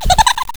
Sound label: pain; faerie